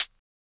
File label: closed HH, Univox SR-95